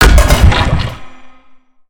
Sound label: Spider Die Hit Goo; creatures mechanical; Lego